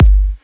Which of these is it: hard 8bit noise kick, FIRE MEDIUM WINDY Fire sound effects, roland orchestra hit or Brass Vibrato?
hard 8bit noise kick